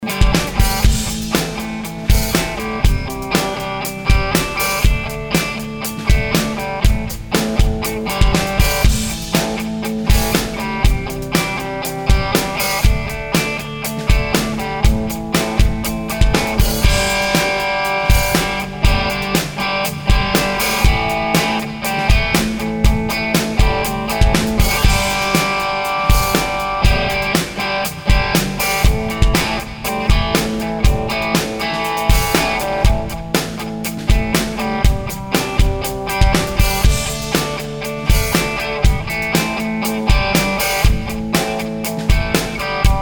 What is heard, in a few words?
thinline bass, maple thinline telecaster